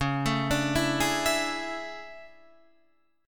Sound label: A Major 11th, guitar